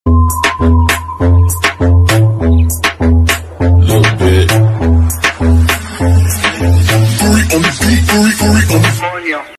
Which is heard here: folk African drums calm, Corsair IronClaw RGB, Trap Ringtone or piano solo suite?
Trap Ringtone